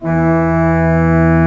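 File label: PEDAL 1 E2, church organ; Best Service; Advanced Media Trax Modern Composer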